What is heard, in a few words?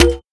gear click metal